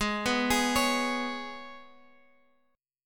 Ab Minor Double Flat 5th down Guitar Open F; arpeggiated; Abmbb5

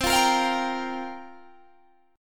C 7th Suspended 4th, Guitar, DADGAD

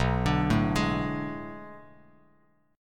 B Minor 13th B down Bass Standard; Bm13 arpeggiated; JGuitar

